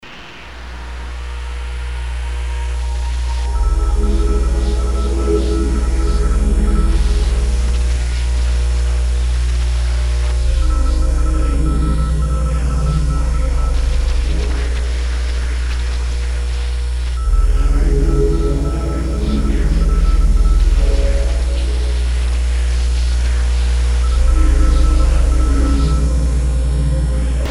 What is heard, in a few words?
underground library